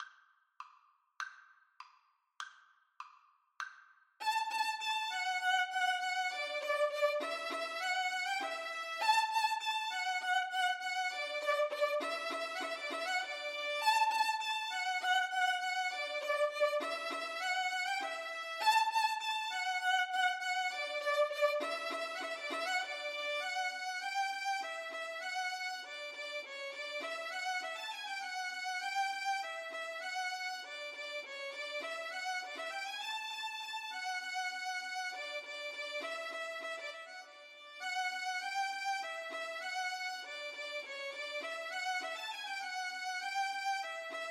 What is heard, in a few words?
allegro violin duet